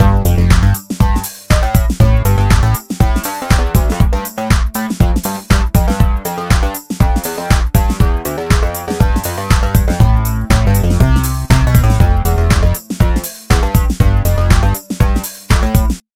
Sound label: Black Beat